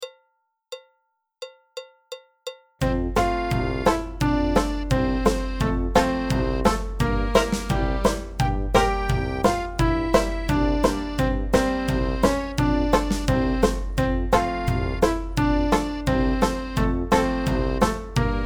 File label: Backing Track; julida polka folk song